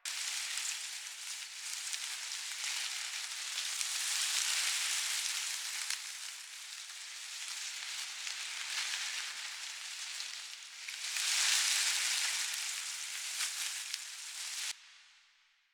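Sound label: Small Bush Rustle Loop; Ambience; Props; Warframe; Nineteen Ninety Nine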